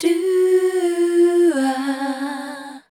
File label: MEL F#, Voice Spectral